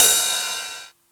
drum ride d key, Royality free drum ride sample tuned to the D note. Loudest frequency: 6880Hz